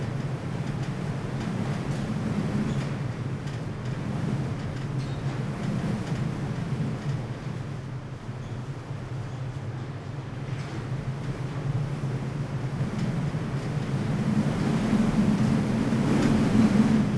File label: Ambience Rain (Indoors); Chapter 2; spongebob squarepants employee of the month